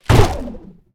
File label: warship attack stake; ships siege